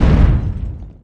Gibb Mech Large Hit Ground; Defiance

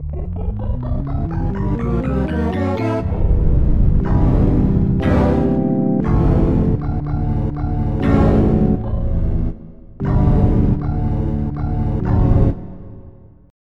Lady Organ; Onj's Soundfont

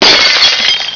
GLASS; Death from Above

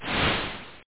TWANG

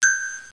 ding

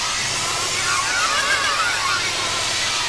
explosive missile; Earth and Beyond; EA Games

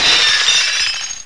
glas brk; Total Chaos Nukem 3d Pack; duke

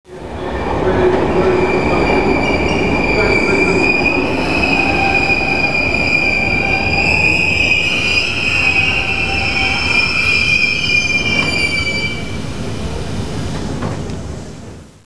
amb

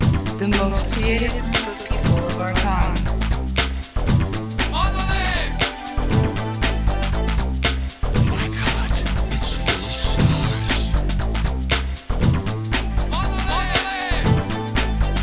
riff; winstar; monolith

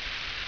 Spray; Kid Pix Demo

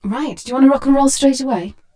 ROCKROLL; lander

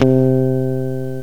muted guitar

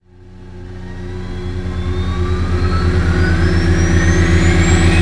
warp start; Earth and Beyond; EA Games

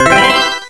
gain life; PC Gamer IT CD 45

